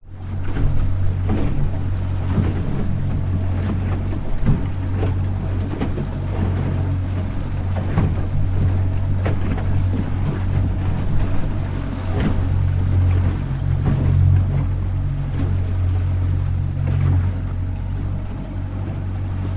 transport motorboat driving sea choppy waves slow recorded close to floor hull